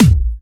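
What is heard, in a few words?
hard bass drums; Bazzdrumz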